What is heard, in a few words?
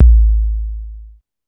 KICK, one shot drums; App Inventor Media